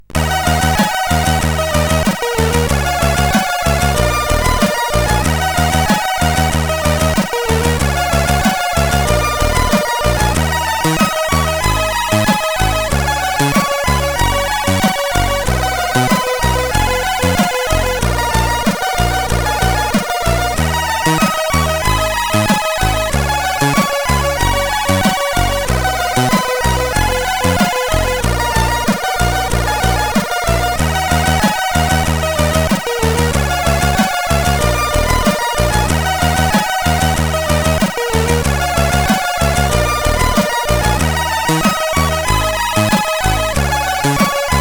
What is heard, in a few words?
Magic Flute, CSG8580R5